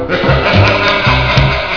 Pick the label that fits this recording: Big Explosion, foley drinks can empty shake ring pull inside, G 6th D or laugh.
laugh